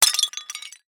vase break

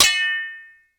metal pipe hit

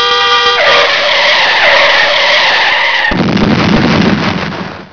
ACCIDENT

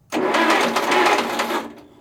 ignition fail

